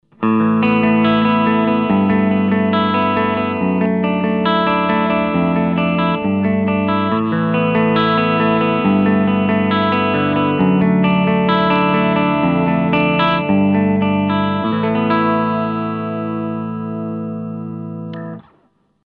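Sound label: Humb 59 Les Paul middle clean; DrZ MAZ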